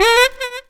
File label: SAX RIF; E-Lab; X Static Goldmine 2; akai